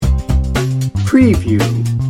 happy hip hop loop

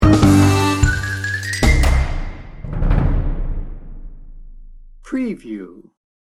hectic harpies sting bumper

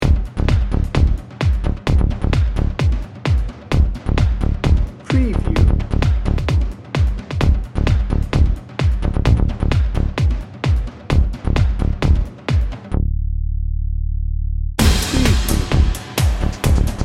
heroic power dnb

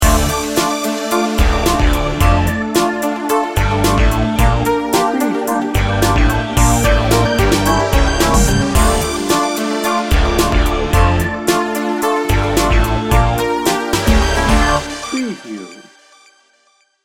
bright and pulsing

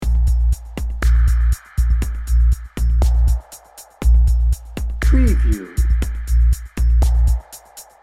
cinematic emotions dnb loop

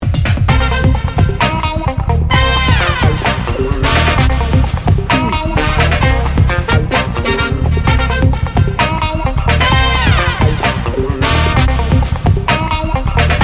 funk in the booty

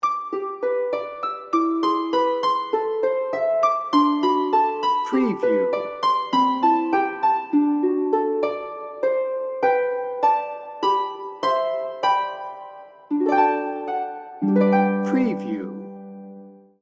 angels we have heard on high

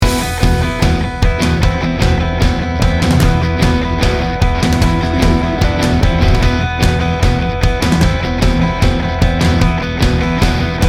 tribal rock

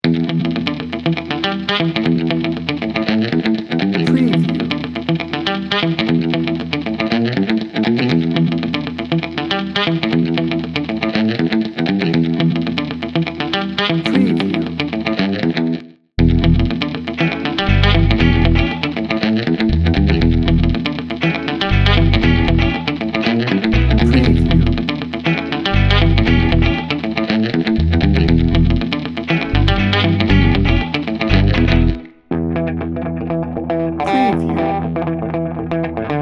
funky guitar bounce no drums

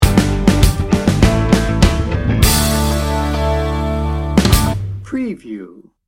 good time rock sting bumper

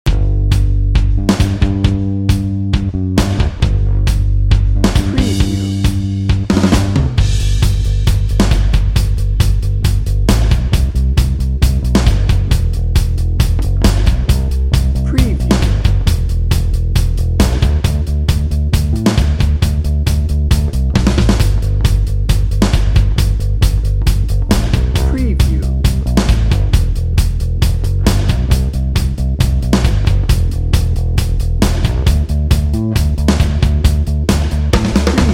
open road

